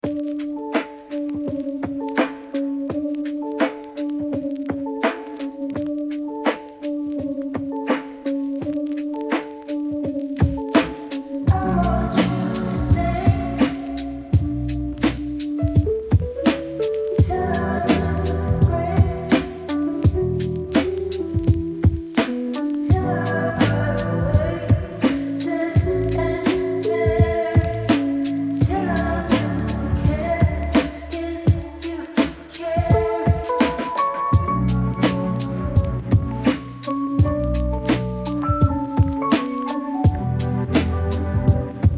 lo-fi hip hop birds

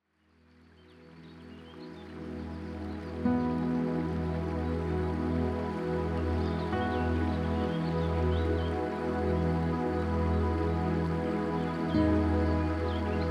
spa

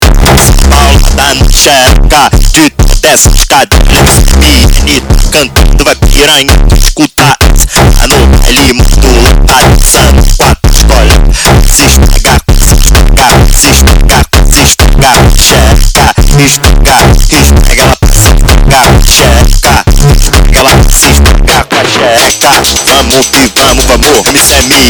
automotivo sped up

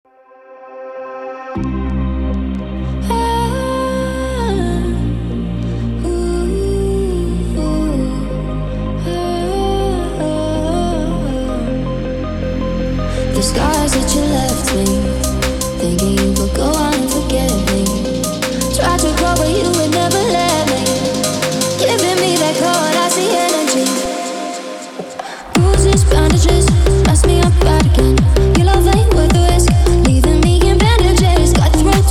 hypertechno

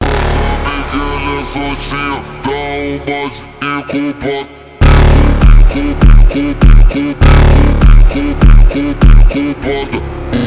funk

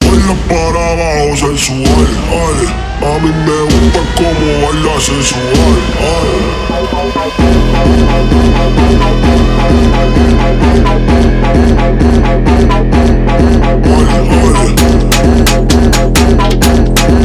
brazilian phonk

